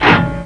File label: GEAR